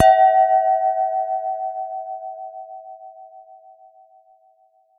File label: Glass Clink, Beer Glasses; Miscellaneous Beer Glasses